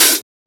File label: Open Hat; FLPRO